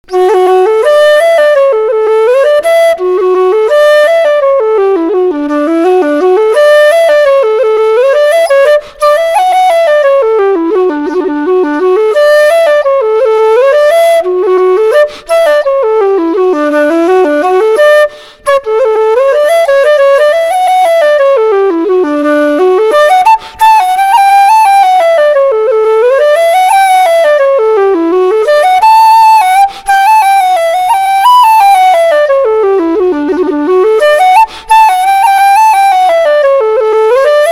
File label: folk flute; torn jacket